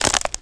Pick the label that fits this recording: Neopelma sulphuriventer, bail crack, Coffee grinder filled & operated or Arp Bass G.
bail crack